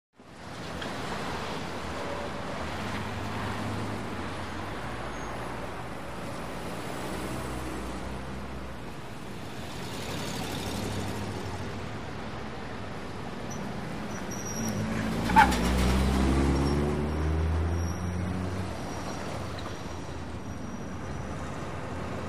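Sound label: Medium Busy Intersection, big city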